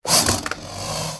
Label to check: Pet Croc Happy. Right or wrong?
Right